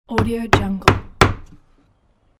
hammer

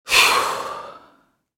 relieved exhale from female; sound ideas

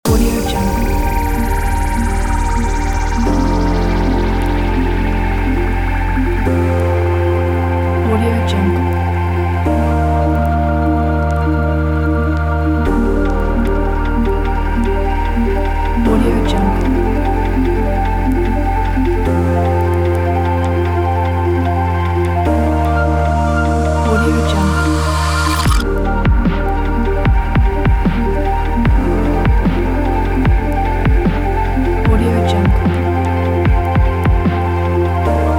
hybrid future bass

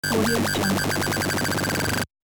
psycho laser wobble

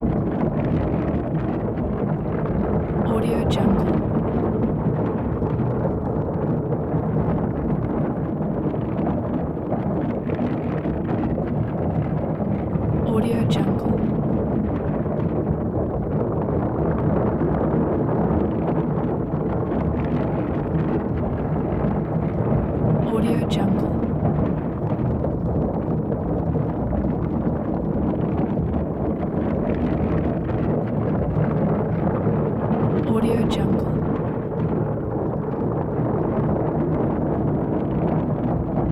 stone age vehicle granite tumbler

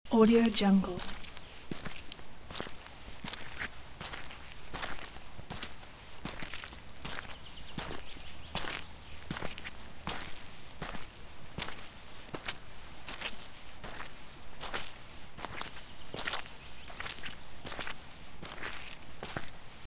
slow footsteps on wet forest path

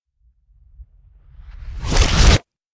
camera surreal reversed blasts; prosoundfx

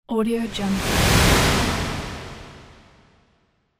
transition

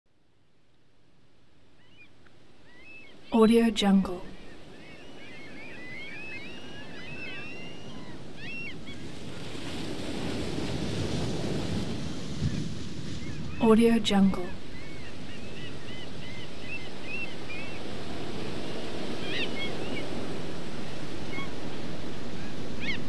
waves and seagulls

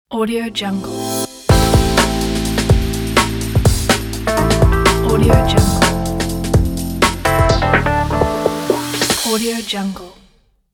soul beat logo

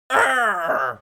creature goblin hit; smartsoundfx

